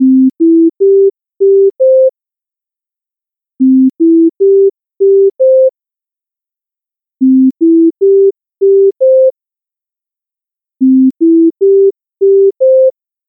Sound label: Cardiac High Priority